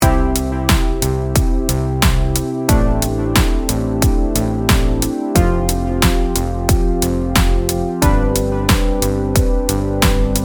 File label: neon vibes; bluewave